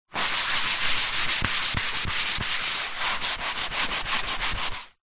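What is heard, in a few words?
household paper towel tissue kitchen roll rub clean wood surface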